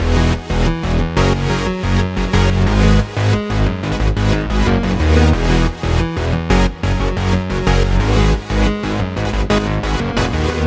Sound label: chorus chords